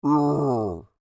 Creature Moan Dissappointed, Human; Groaning; Voice

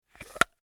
Emergency Medicine Bottle Open Lid Medium; Pops; Objects

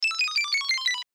Video Game Descend High; Video Games; Technology; Blastwave

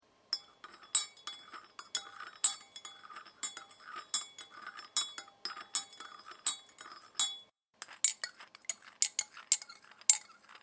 Tea Stir, Stirring a cup of tea with a teaspoon.; Dishware; Household

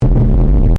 Distortion Thick; Sound Design; Distortion Sound Effects

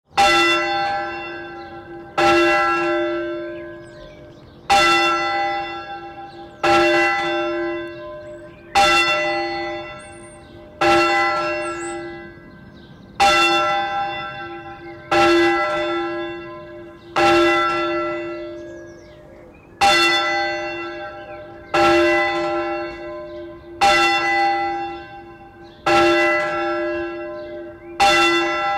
Church Bell Tolls

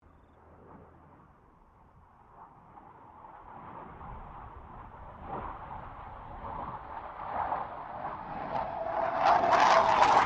BAE Hawk 127 pass; Airplanes (Sound Effects:Vehicles)

